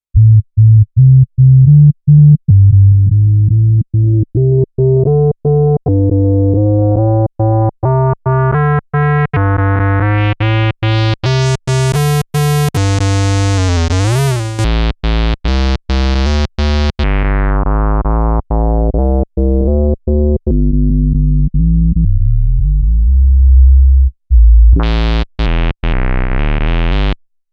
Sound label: Electro Harmonix Bass Mono Synth Sub Ctrol 0